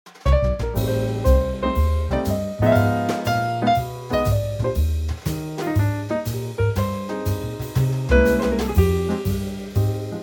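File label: Eb Trio, Backing Tracks for Male Vocals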